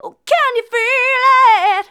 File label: Female vocals and robo-vox